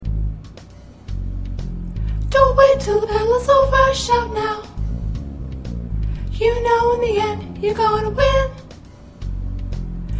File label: Soprano